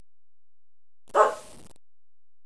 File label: DOG; animal sounds